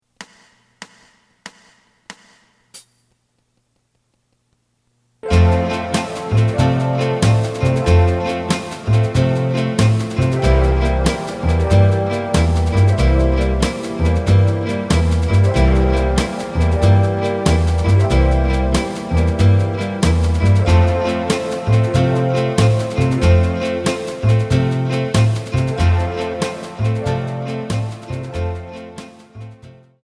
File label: Asax